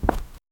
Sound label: semi realistic